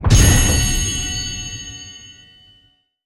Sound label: Muliplier Land; netent